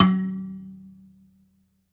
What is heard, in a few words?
BASS KALIM, Kalimbas